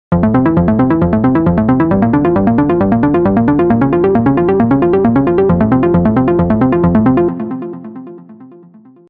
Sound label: Arpeggio